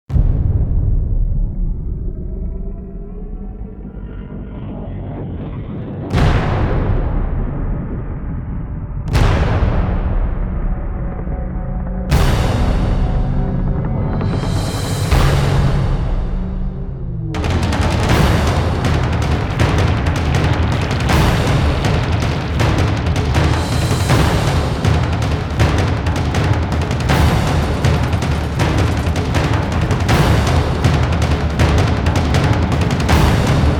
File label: drums fx